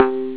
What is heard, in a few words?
bong; xfce